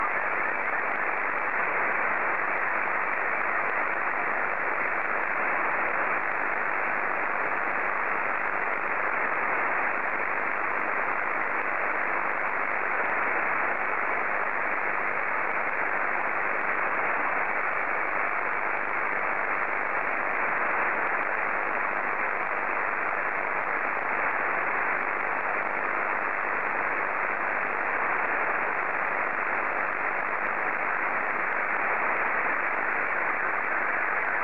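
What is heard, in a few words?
Scottie 2, slow-scan television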